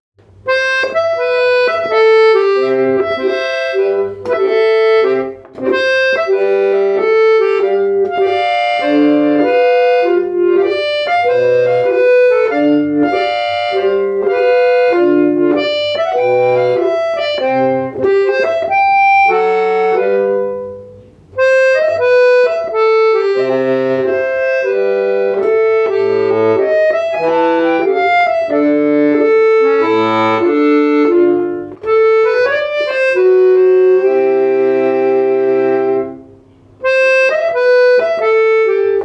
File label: Violon